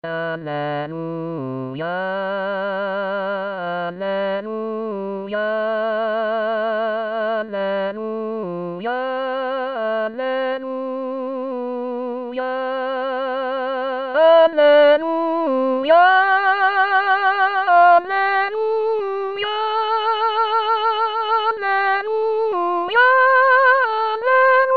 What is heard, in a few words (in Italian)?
Alleluia Cantico dellagnello Inizio Soprani; voci guida; corali in vaticano 2024